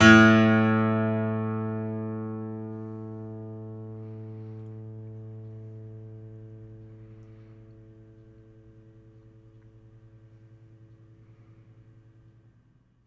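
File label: guitar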